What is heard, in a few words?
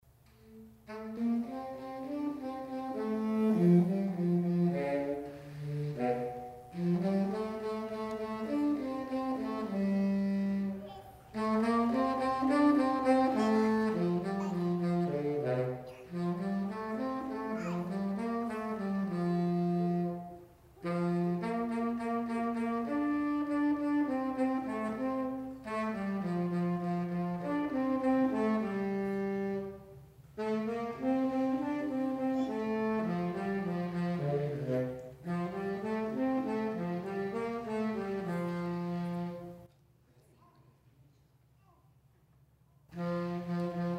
Instrumental